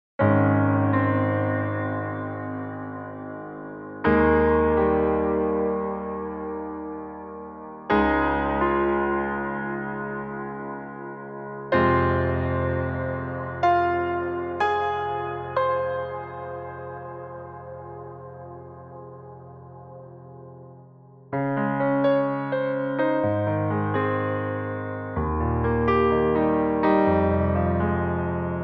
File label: Montage Worship Large